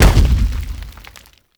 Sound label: Blunt Heavy Impact Rock; Weapon Impacts; Player; Environment